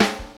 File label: puffy steel snare drum g sharp key, Royality free snare one shot tuned to the G# note